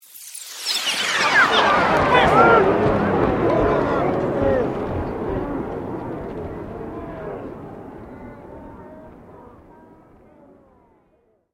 Sweep Slow; Created sweeps, swooshes and other movement effects; Sweepers; Sound Design; Foundation4

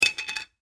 Foley Lid Set Down On Counter Plastic; Set Downs; Objects

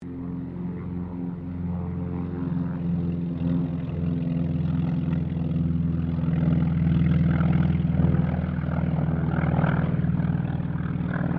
Trojan pass, Airplane; Trojan 0-2; Mixed aircraft squadrons; Airplanes; Vehicles

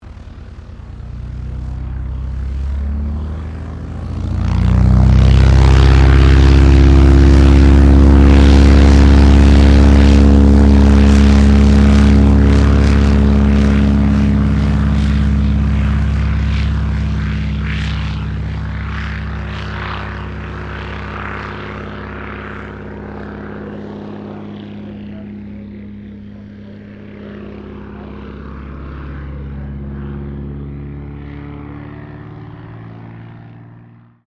AIRCRAFT CESSNA O-1 BIRDDOG TAKEOFF; Cessna Birddog spotter aircraft; Airplanes; Vehicles

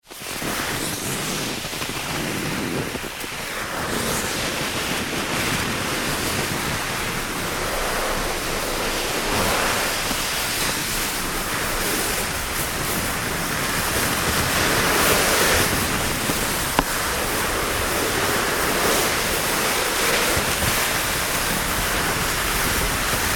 Ski Traveling on Snow and Ice, On board skiing; Weather

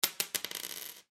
Coin Penny Drop On Counter Short; Foley; Money; Objects

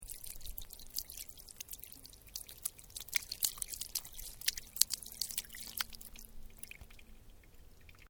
Playground drink fountain water; Various playgorund equipment; Playgrounds

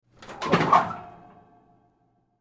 Oven Door Open; Household; Appliance; Appliances; Blastwave

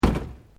Metal Light Movement Minor; Materials

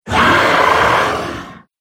Cave Monster Attack; Monster Sound Effects; horror

